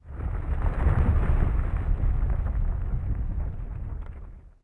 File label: lava; portlux